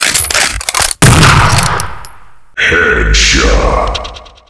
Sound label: ringtone